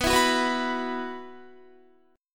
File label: B Suspended 4th, Guitar; Bsus4; strummed; 19,19,16,16,18,18